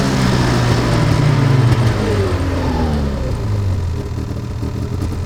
vehicle snowmobile rampdown

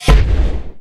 atgm pump

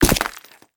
foot glass stone, footsteps

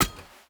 salvaged axe strike soft; Rust

